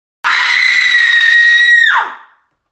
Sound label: screaming